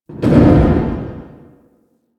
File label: Metal Fall; Ambience; Warframe; Corpus Railjack